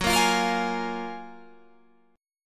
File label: Gb Major 9th, down, Guitar, Open G